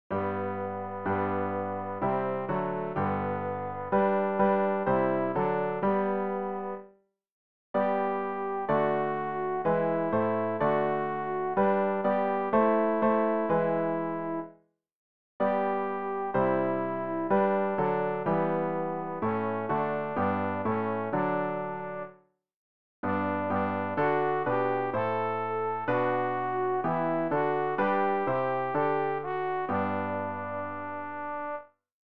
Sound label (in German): alt, Übehilfen für das Erlernen von Liedern; liebgott du bisch da und weisch won i stah; ref-gesangbuch